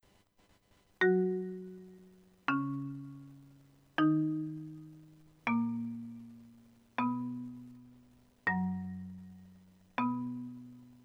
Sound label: Canon Flute Percussion Rock; Fluiterkorps; Tijdsduur – 4.20 min.; sprankelend werk gebaseerd op de beroemde Canon in D van Johann Pachelbel; Moeilijkheidsgraad – gevorderd